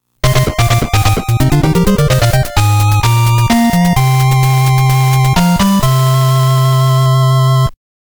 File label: fanfare; NES; Shatterhand